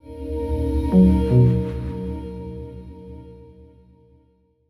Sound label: boot sound; HMD; Pulse